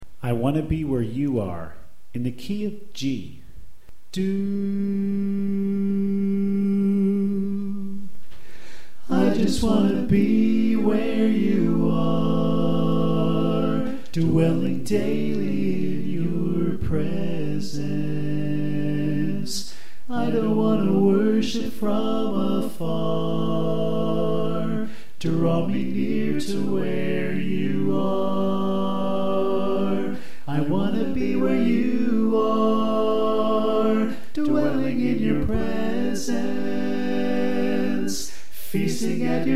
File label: Soprano Left